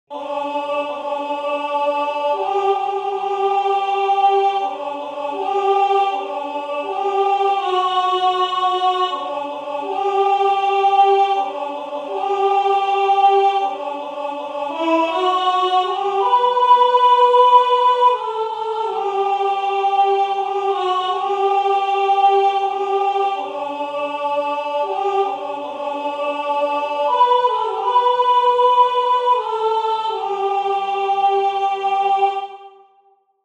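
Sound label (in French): soprano, choeur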